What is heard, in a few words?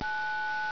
DIAL; 10,000 Graphics Pack Volume 1; Media Graphics International